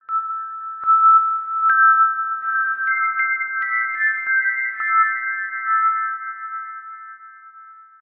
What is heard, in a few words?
audio volume change